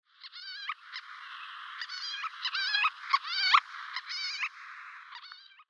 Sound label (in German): Weissschwanzkiebitz Vanellus leucurus; Vogelstimmen